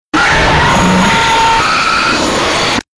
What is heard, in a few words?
jumpscare; insanity 2 2015